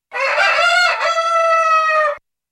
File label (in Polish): pianie koguta